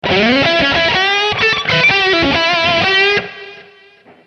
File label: 115 solo